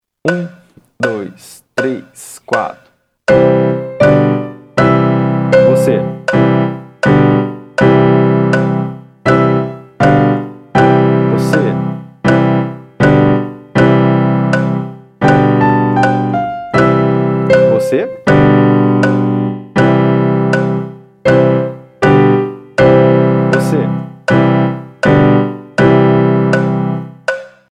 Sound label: Frere Jacques 80 BPM; Modulo 1 Aula 15